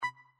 nav bleep

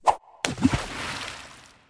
splash; Pirates; mermaids song

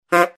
honk; disney; mashup

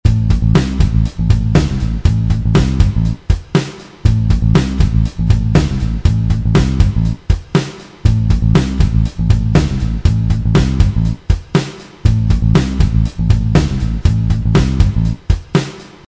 drum bass

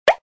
pop; Cars; tractor tip

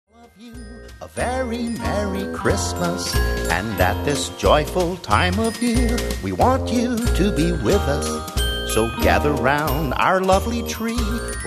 karaoke vocal; christmas favorites; disney

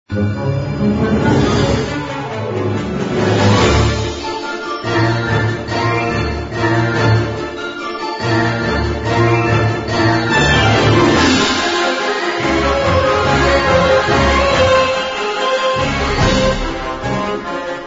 music catch; the missing amulet

